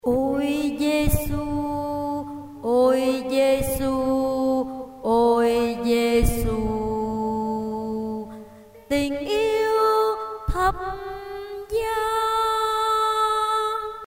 basso

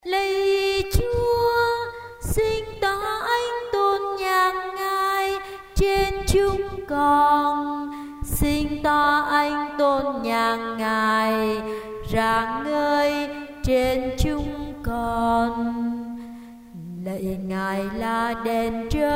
tenor basso

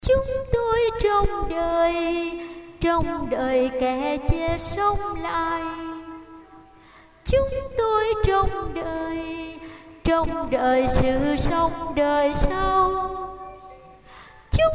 sop alto

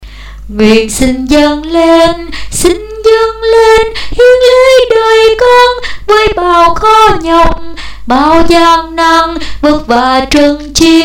basso

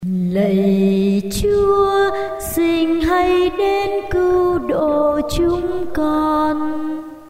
tenor basso

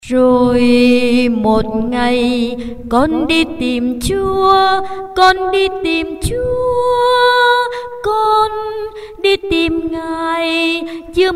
sop alto

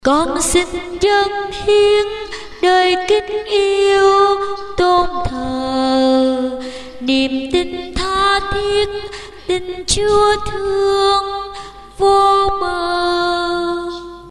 sop alto

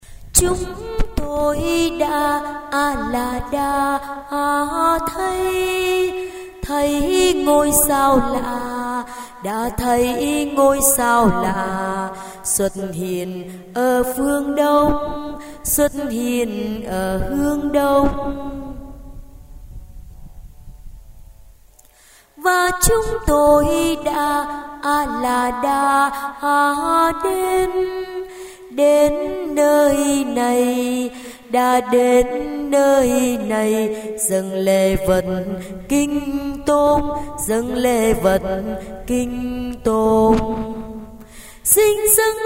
alto

